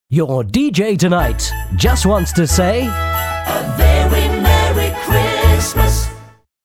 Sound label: Christmas Jingles